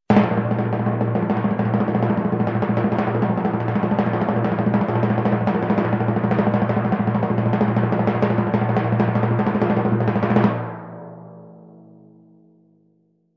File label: Timpani Roll